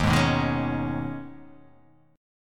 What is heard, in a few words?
D sharp 7th Suspended 4th Sharp 5th